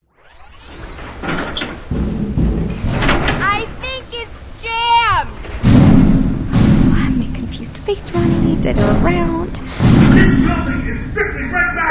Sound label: Grenade